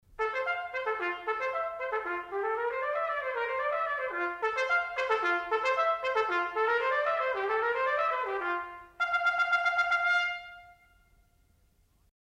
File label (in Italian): tromba suono; strumenti